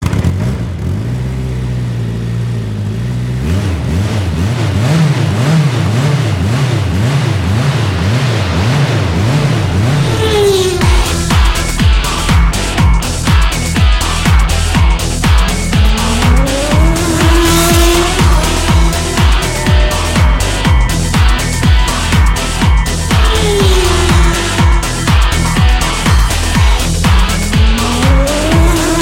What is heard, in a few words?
Engine Music